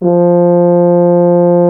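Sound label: French horn C3; MONO BRASS; Greytsounds VOL-1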